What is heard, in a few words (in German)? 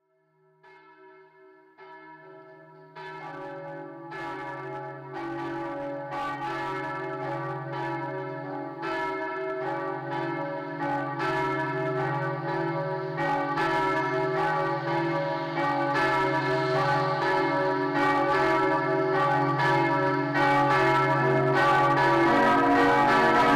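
glocken lutherische, church bells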